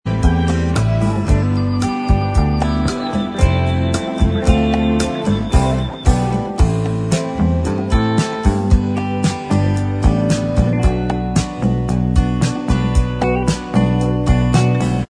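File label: instrumental, backing tracks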